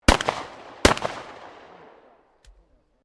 Shotgun 12 Gauge Double Barrel Firing 2 Shots; Sporting Shotguns

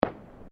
Fireworks Single Firecracker; Backgrounds; CraftedSignals

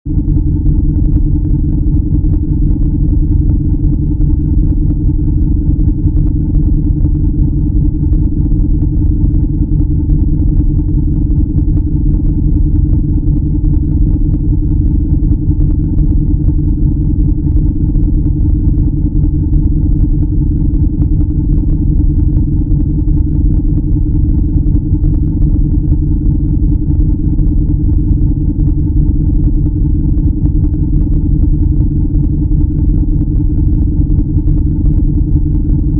Spaceship Engine, Room, Rumble, Science Fiction; 5.1

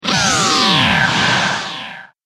Exploding Sf Sound, science fiction

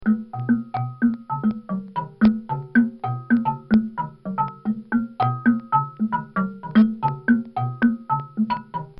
Lujon African Percussion Instrument; Drums; Bobby Cole

